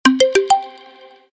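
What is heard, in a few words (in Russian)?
звук оповещения на мобильном телефоне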